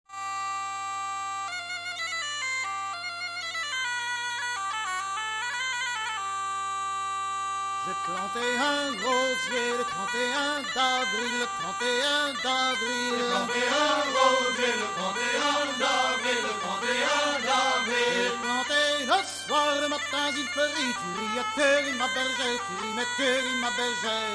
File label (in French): chant et veuze en presqu ile guerandai; tradition vivante de bretagne; presqu ile guerandaise